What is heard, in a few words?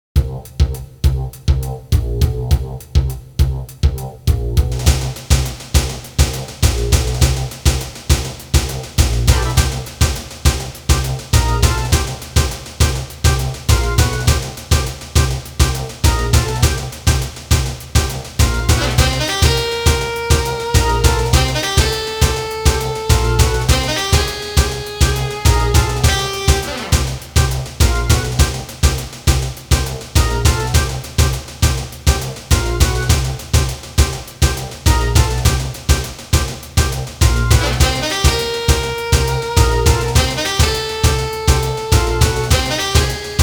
Death Match, pc engine; World Heroes 2; RING OF DEATH (Death Match 3)